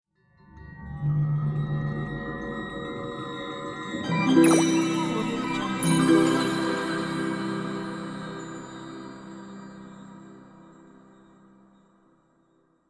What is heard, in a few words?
magic transformation